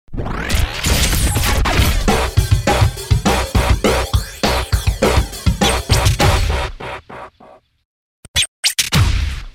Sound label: Bop Groove; DJ sounds; Mtrax